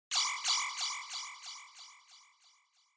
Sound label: Single Accent Low Delay